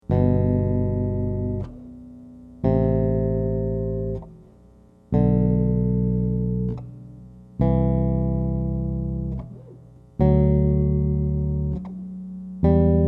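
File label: G major scale in tenths, bass; chords and double stops on bass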